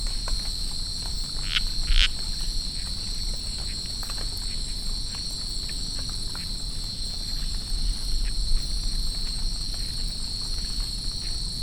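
occidozyga sumatrana, Yellow-bellied Puddle Frog call; Singapore.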